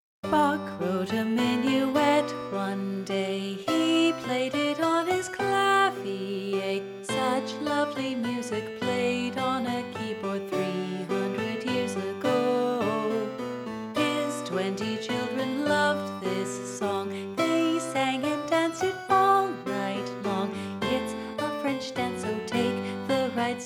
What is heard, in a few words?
Minuet in G Major